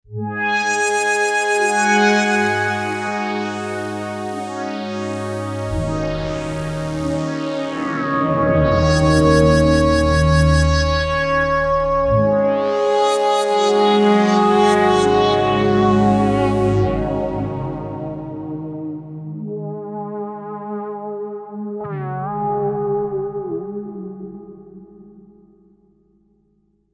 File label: Mandoloop